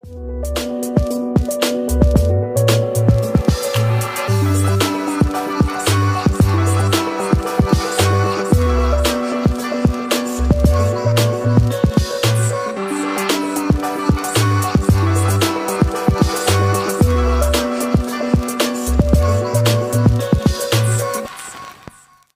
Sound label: speed up